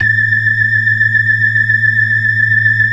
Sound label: organ; Keith Emerson Signature Series